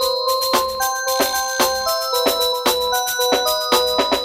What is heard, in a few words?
Halloween Hip Hop